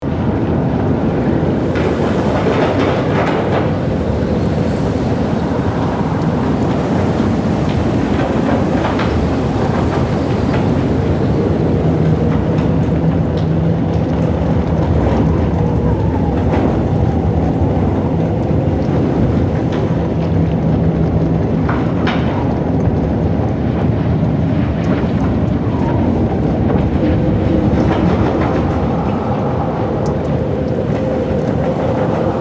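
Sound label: Ambience Blizzard Tent Interior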